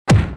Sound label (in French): coup